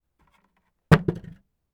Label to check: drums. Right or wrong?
Wrong